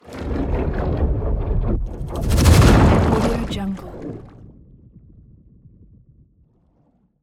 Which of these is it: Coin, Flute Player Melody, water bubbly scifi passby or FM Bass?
water bubbly scifi passby